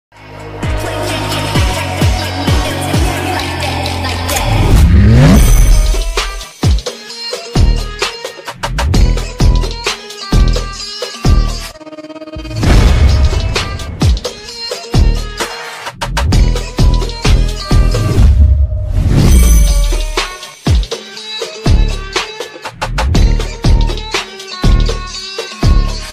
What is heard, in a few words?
mixed BGM ringtone